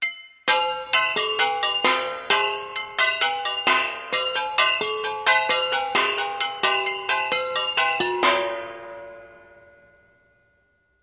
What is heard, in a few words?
Pipe HIts; eShop